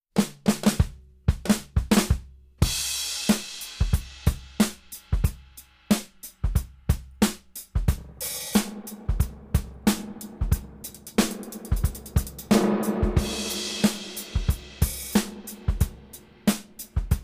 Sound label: REVERB groove drum